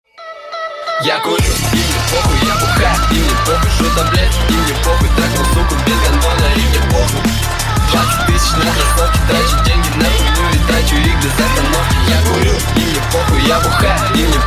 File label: drum and bass remix